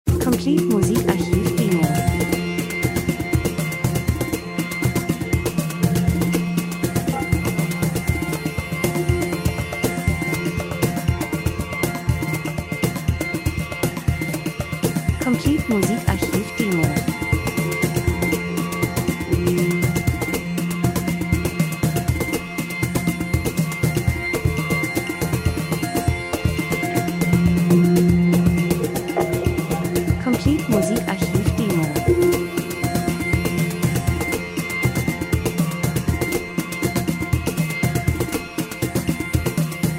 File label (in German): drums, rhythmus; Percussion Asien Traditionelle Trommeln Sounddesign Land und Leute Buschmann Reisefilm Natur Dokumentation